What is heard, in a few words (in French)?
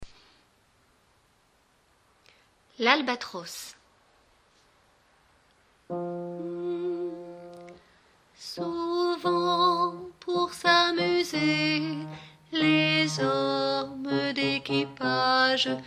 l'albatros, basses